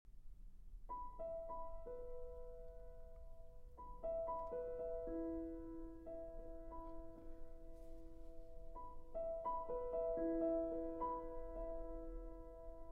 Romances For Cello and Piano; 14 Romances, Op. 34 No. 1, The Muse; Arr. Sheku Kanneh-Mason

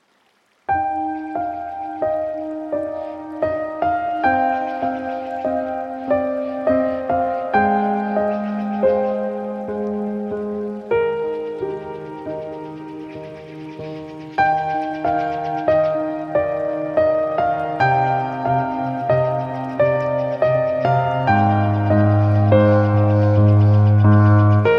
Magic Piano

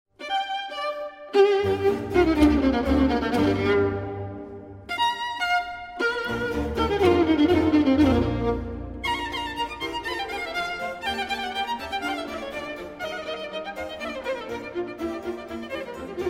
Sonata in G Minor, Allegro; 'Devil's Trill'

